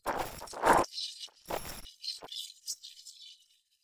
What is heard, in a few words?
birds